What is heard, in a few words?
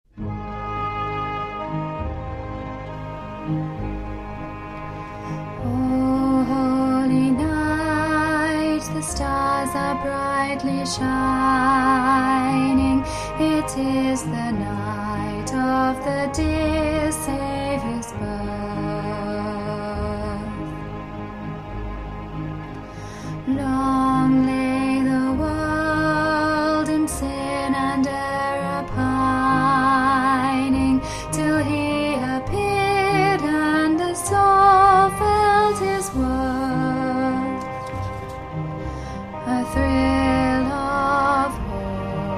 tenor bottom part